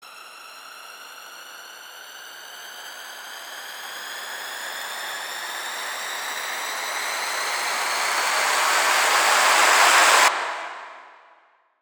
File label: riser simple moombah cinematic trailer